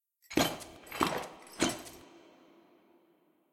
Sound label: walk handstand exit phase